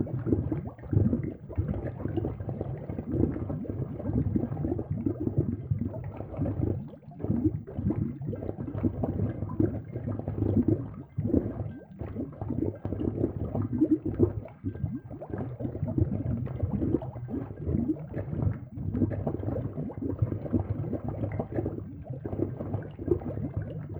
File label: oil refinery bubbles; Rust